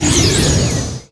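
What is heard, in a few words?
frost; The Elder Scrolls III - Morrowind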